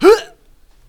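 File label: Jump, male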